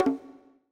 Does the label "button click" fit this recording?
Yes